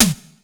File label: SN, Casio CTK-5000